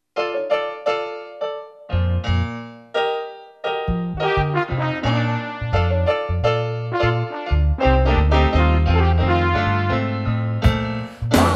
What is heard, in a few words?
housing choir; albany